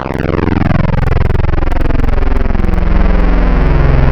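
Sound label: Flange, Synthwave wavetable; Synthetic's Serum Collection; BVKER - Synthwave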